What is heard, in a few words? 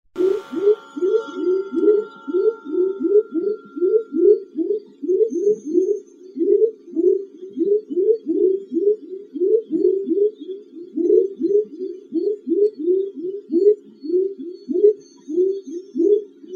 FROGS SOUNDS from the porch Costa Rica